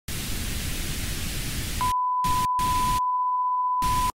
technical difficulties

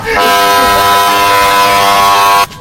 bad mic

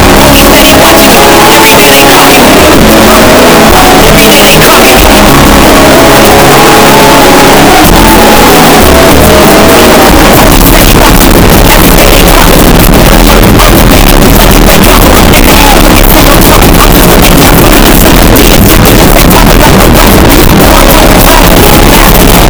bass

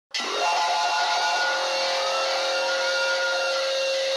power drill

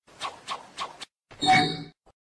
jutsu sound

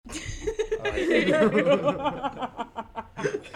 deep laugh

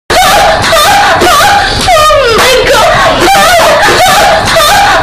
loud ahhhh

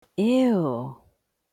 eww

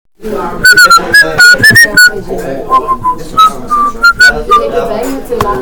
distorted whistle

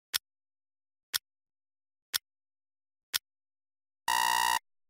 sec timer

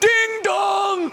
ding dong; freddy

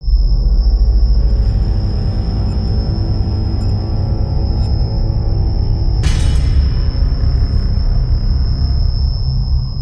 suspense cinematic

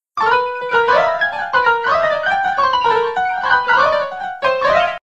piano

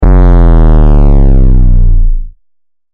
core boom

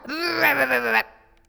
barking

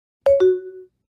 incorrect sound; ixl

